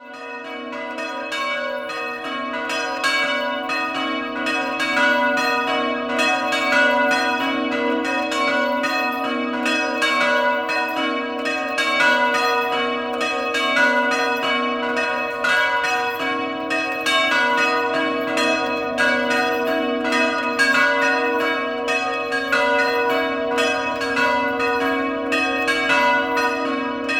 Ovoledo, campane

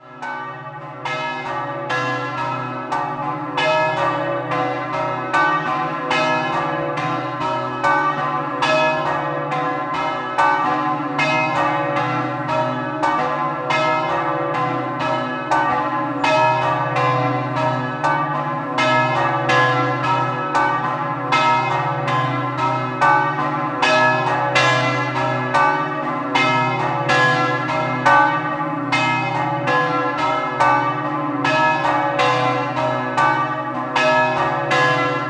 Marsure, campane

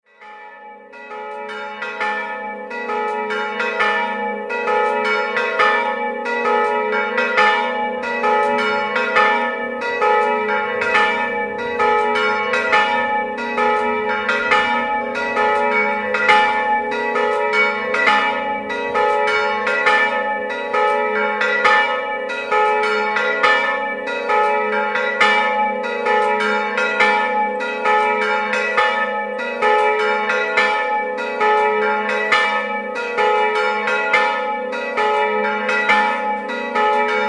GLAUNICCO, campane

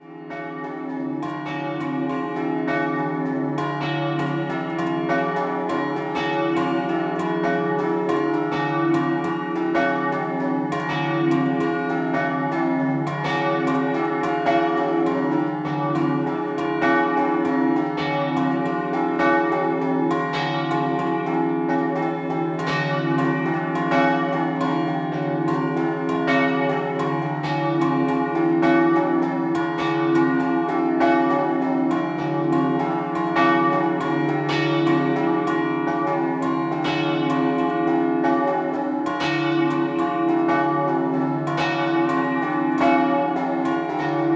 Raveo Scampanottata, campane

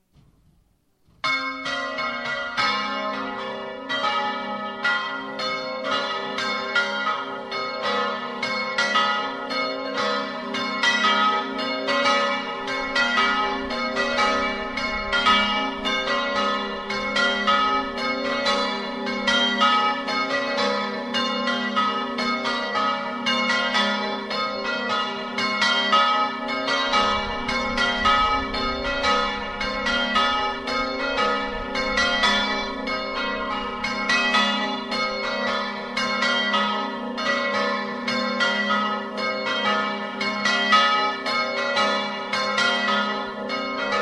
TRELLI, campane

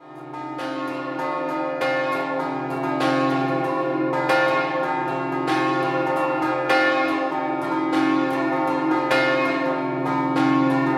PRADIELIS, campane

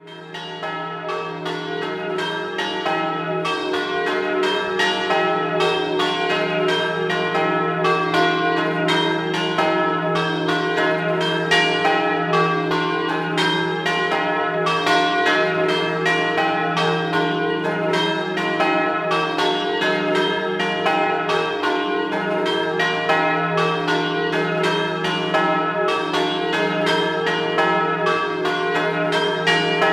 Carpeneto Doppio, campane

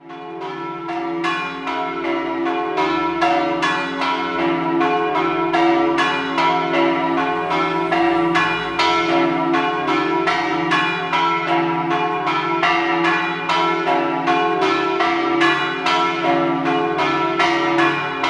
Prata di Pordenone, campane

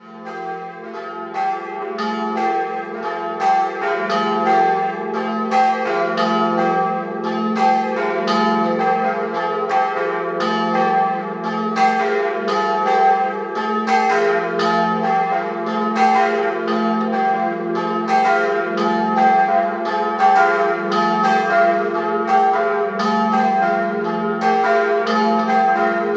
Visinale, campane

